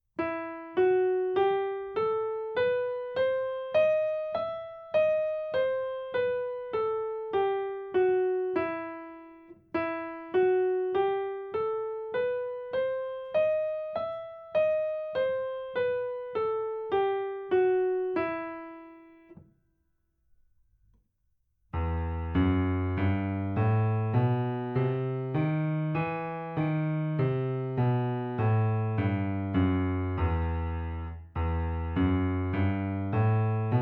Harmonische e-Moll Tonleiter Übung

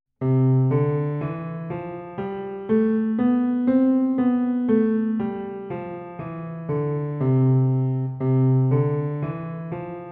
C-Dur Tonleiter Übung

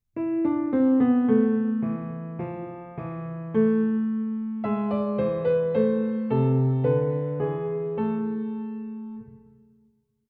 Kleine melodische Übung

